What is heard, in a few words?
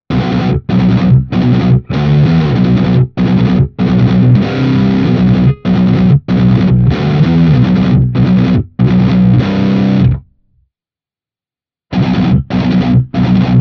Schecter BANSHEE MACH 7 rhythm Riff A all positions